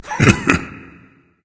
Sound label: heh heh berserker; cuchulainn